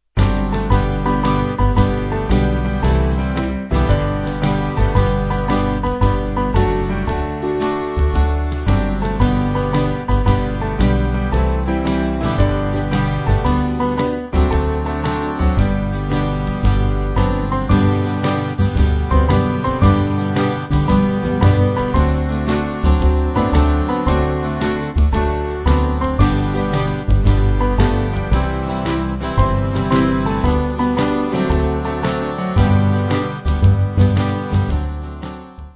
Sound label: When The Trumpet, Band